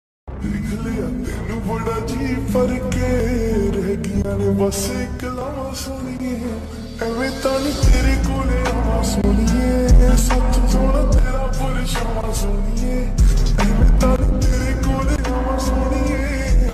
lofi ringtone

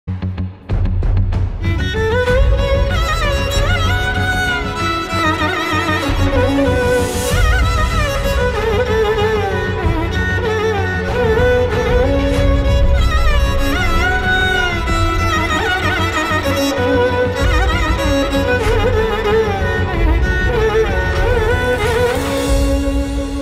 love bgm; krishnarjuna yuddham

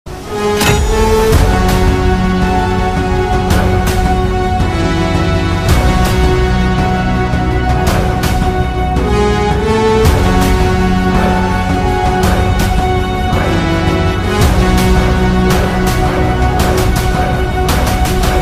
background music ringtone; Gaami; vishwaksen; chandinichowdary

